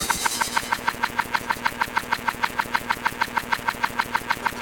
machine, Sci-Fi; 3maze; Sci-Fi FX

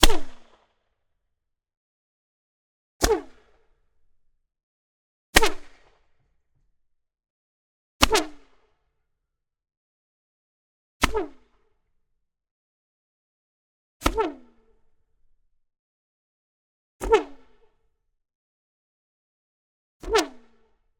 Potato Gun Shot Apples Heavy Blast; Pipe-based Cannon; Dramatic Cat

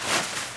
cloth; hl2

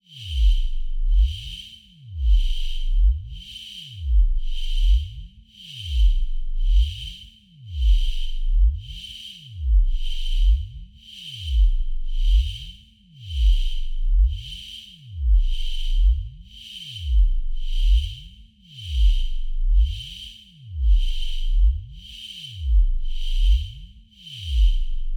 Bass And Steam C#3; SYNTHESIZED MOODS; SoundFxwizard

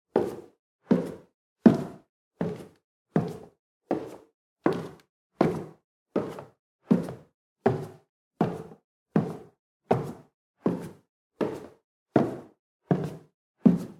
Footsteps - Wooden Stairs - Down - 80 fpm - Loop; The Sound Pack Tree; Footstep Loops

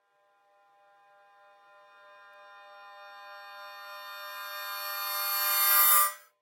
Time Bending Risers, Cymbals; 344 Audio; Cymbals From Hell

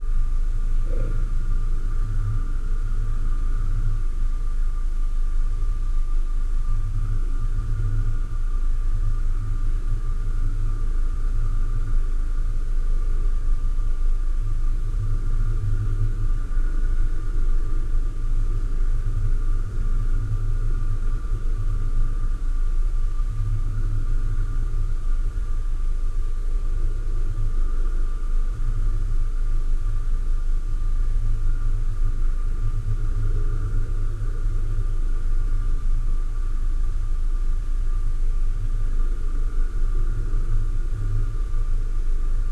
Eerie Boiler Room; InMotionAudio; Sinister Textures Volume 1

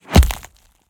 creature attack whoosh impact, Cave Creature; Bluezone

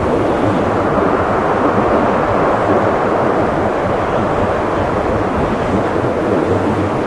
hole amb, ambient; hl2

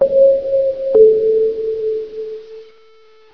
city listen tone

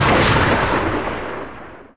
ar2 echo; hl2

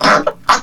grunty, headcrab poison; hl2

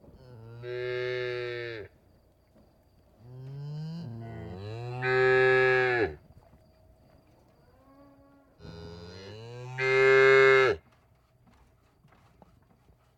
Cow moo, variety of versions, barn ambience; Sound Ex Machina; Animal Farm

